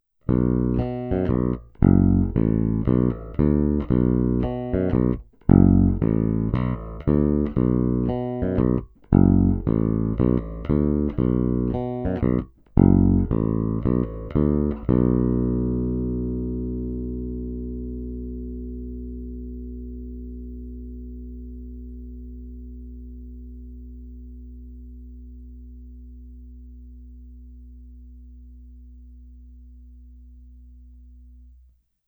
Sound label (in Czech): Cívka 1 a 3 – kobylkový a krkový snímač; já tam zase slyším celkem dost Rickenbacker nebo opět Gibson SG; Ernie Ball Music Man BIG-AL